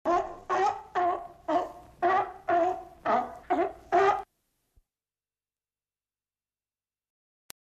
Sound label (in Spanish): foca